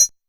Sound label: CR78 Beat, Roland SH-32 Drumset; Drumset 03